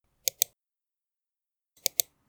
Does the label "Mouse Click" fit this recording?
Yes